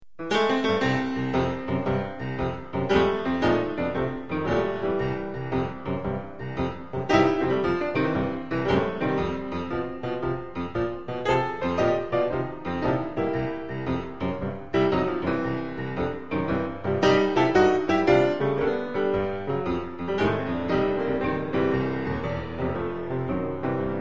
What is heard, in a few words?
boogie blues piano